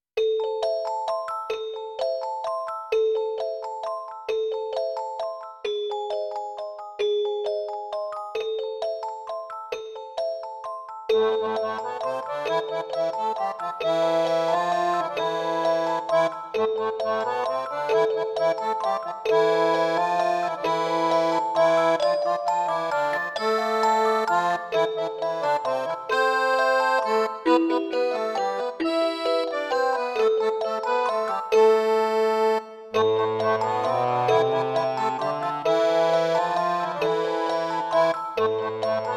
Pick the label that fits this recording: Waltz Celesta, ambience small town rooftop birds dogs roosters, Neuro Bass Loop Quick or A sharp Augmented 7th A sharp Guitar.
Waltz Celesta